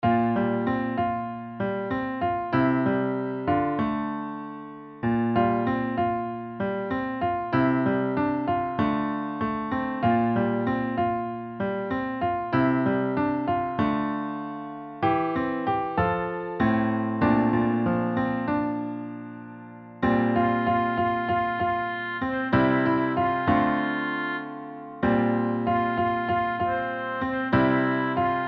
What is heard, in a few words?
piano melody